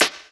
SD, snares; EastWest; Dance Mega Hip Hop Electro

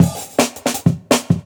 Jungle Breaks; 90s Sample CDs; Original Jungle Breaks

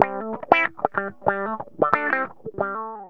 GTR, Funky; Zero-G; Funky Elements

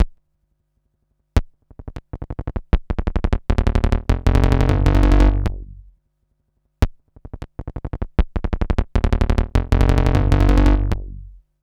SYNTIX BASS, BASSLINES 175; Masterbits - Tekkno Trax

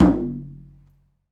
MalaiyuDrm; Club-50 - Foundations Roland; Exotic Drms

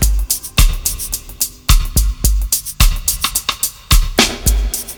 DRUMLOOP, Ragga & Dub Reggae; USB Soundscan vol.27; DRUMLOOPS

